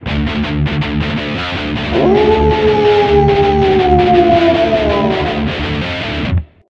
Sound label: Riff; Idle Wizard